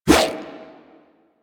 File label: Sword Swipe, Whooshes